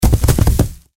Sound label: Impact Earth Rock Boulder Ground Multiple; Rocks; Sound Effects:Materials; Blastwave